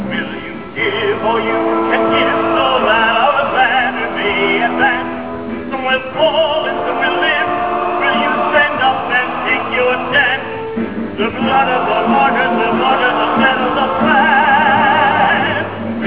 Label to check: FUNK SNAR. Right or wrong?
Wrong